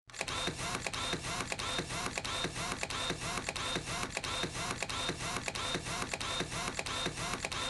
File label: robot; bruitages